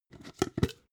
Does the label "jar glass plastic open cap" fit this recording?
Yes